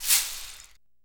Abacus Rattle; Universal SFX

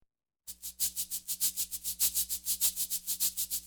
Cabassas Accent Rhythm; Electa Quinney

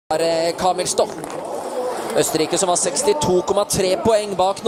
scream; EAU CLAIRE HTS; THOMAS NAUNAS

